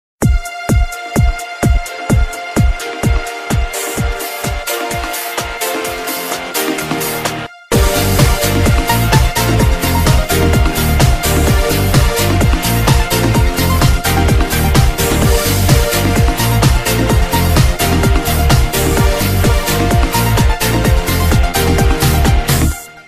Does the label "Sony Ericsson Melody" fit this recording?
Yes